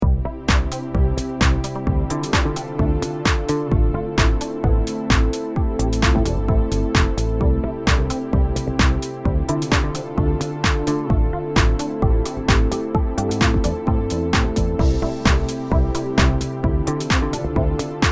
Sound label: jingle bells